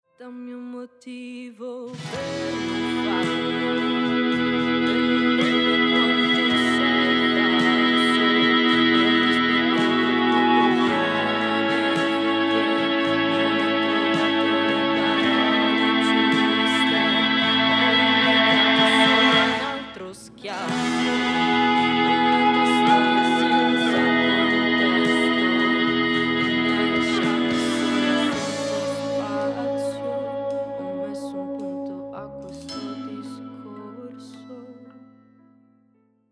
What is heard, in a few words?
EBOWS